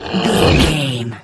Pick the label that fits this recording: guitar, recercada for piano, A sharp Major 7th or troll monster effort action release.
troll monster effort action release